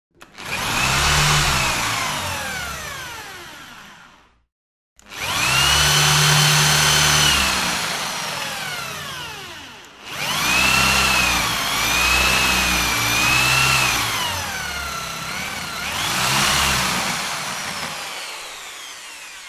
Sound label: Drill Run X2, hand drills